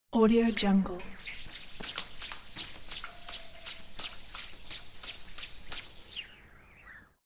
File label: spinning twisting thin metal wire